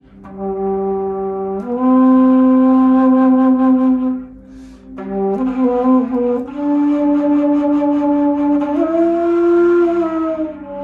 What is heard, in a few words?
shankh bansuri flute